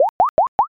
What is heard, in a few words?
bubble